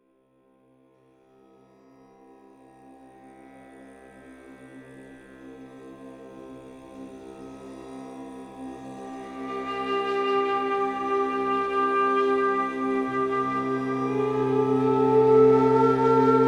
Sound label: Bansuri